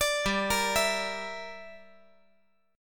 G Minor 6th

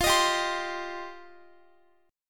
F 7th Suspended 2nd F down Guitar; F7sus2; strummed

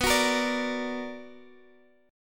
B Minor Major 7th, Guitar, Half Step Down

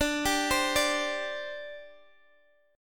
D 7th Suspended 4th, down, Guitar, Standard; arpeggiated; capo 9

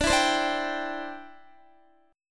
D 7th Suspended 2nd Suspended 4th D, Guitar; D7sus2sus4; strummed; x,17,14,17,14,14

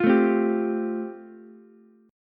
A Minor 7th Sharp 5th A, Ukulele; Am7#5 strummed; 10,9,8,10

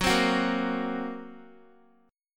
Gb Major Flat 5th, Guitar; strummed; JGuitar

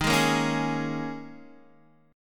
D sharp 7th Suspended 4th, Guitar, Open D

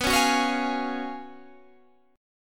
F sharp Major 7th Suspended 2nd Suspended 4th, B

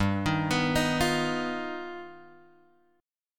G Augmented 7th G; G+7 Chord {3 X 1 4 4 1} arpeggiated

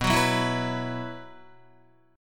B Suspended 4th, Guitar; Bsus4 strummed; JGuitar

